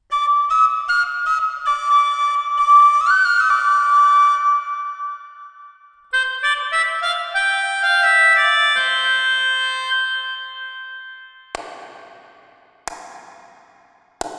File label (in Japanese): プレート・リバーブ：Plate 1, EMT 140。温かみがあり、滑らかで、かつきらびやかな減衰音が特徴; スタジオに常設されているヴィンテージの鉄板リバーブ。; 真空管回路を持つクラシックなEMT 140。温かみがあり、滑らかで、かつきらびやかな減衰音が特徴。